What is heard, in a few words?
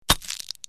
bulb, KAMERA BLIXTLJUD